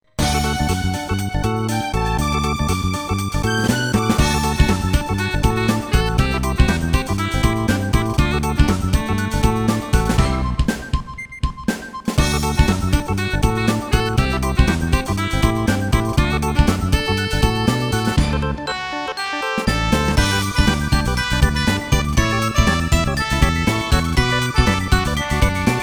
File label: Rhythm Shakers; Move to the Music